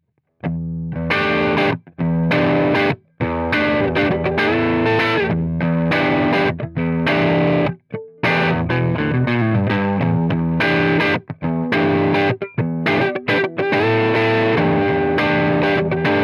clean; brunetti

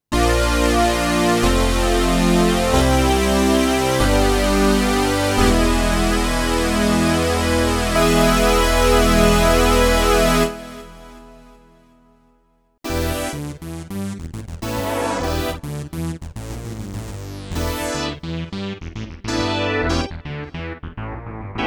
synth polysynth; kurzweil pc361

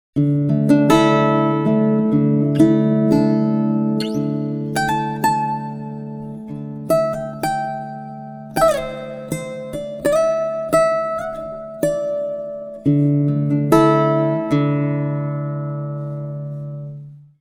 pitchshift guitar; bias peak

